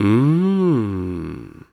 Human Male Mmm Sexy Mmm

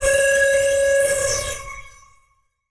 Title Transition Bell; Adobe; Production Element

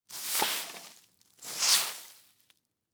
Household Broom Sweep Leaves On Cement Two Times

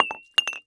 Household Glass Knock Over And Spill Water

